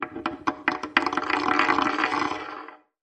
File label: Dreidel Spin Drop